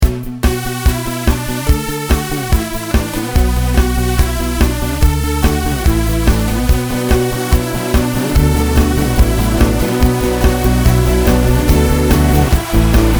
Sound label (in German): Moseljingle